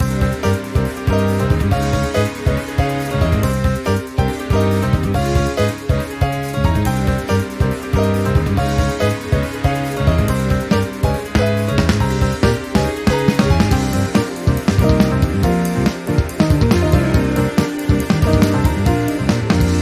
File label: Jazz; Inubashiri